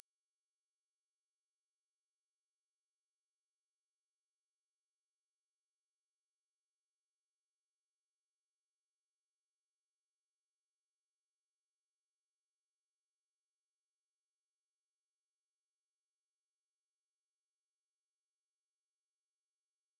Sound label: 20khz -6db